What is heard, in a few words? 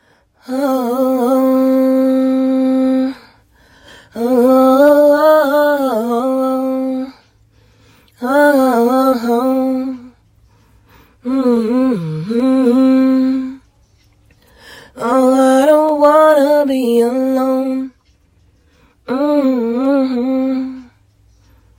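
pop rnb, 60, C minor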